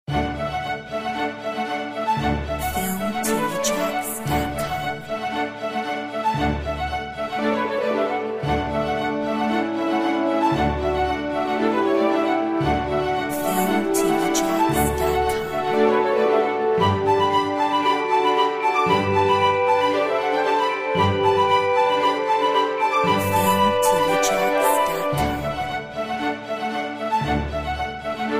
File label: Venezia strings; Filmtv-tracks